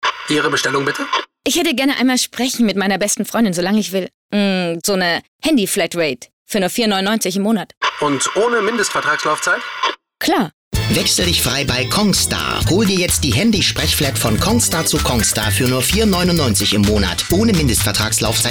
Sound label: funk; congstar